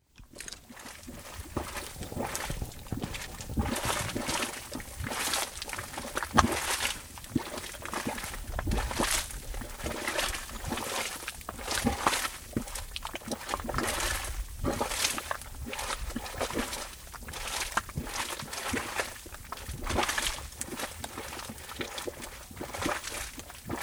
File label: thumb geyser basin